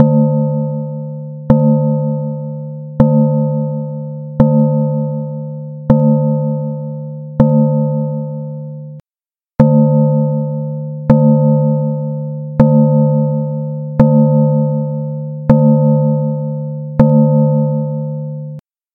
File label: Bell